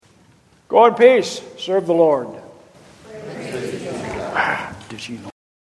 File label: Closing Response